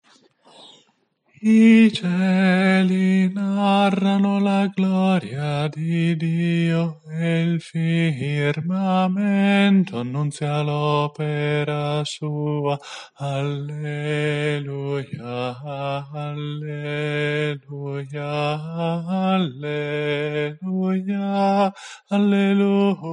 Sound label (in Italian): contralti